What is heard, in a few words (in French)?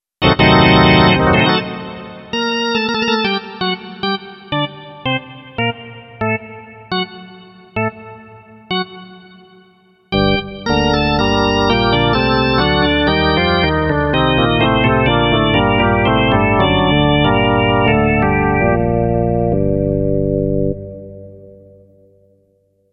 additive exemple, synthese additive; reason